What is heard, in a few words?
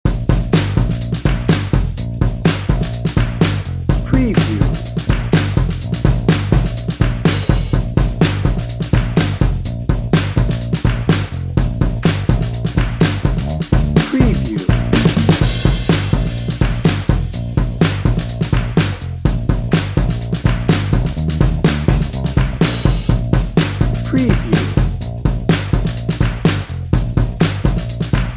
happy upbeat retro soul dnb